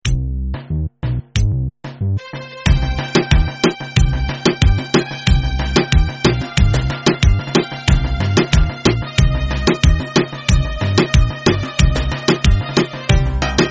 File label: Reggaeton